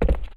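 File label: gritty cement land, footsteps; chief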